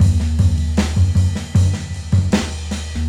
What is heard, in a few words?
beats